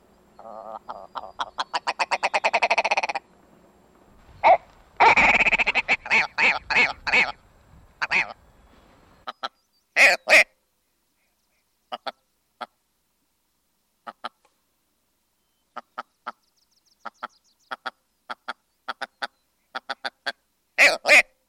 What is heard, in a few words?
willow ptarmigan calls